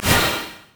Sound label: arc throw; Diana